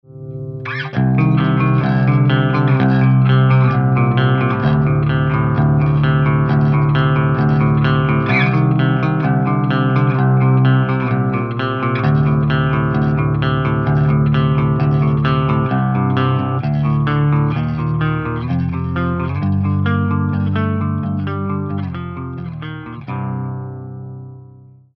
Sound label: FAME T120 CLEAN, guitars